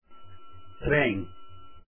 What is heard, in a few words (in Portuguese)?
trem